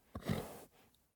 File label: horse grunt